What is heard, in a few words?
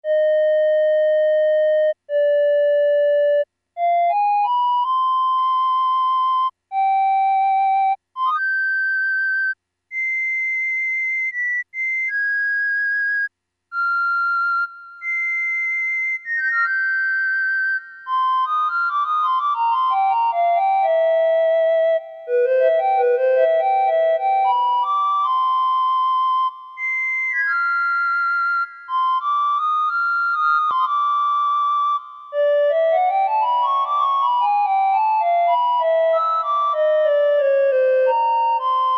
flute, Casio MT-68; preset sounds